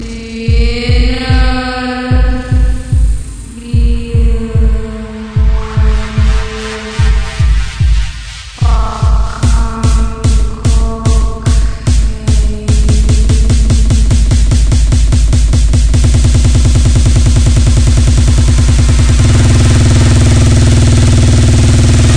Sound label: bass blast tone